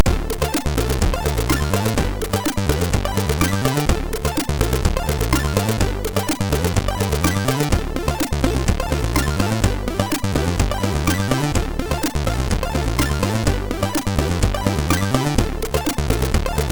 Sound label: Funk, sid, MOS6581R3